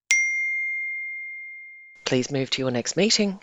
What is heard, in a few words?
single chime please move to your next meeting